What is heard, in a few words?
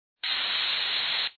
shower; Audrey Pony Daycare